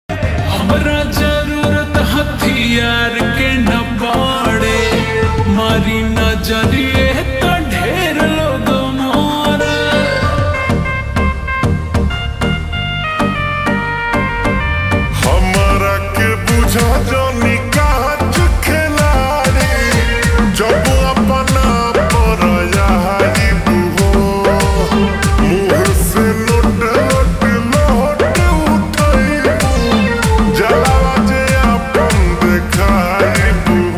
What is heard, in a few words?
Ringtone slowed reverb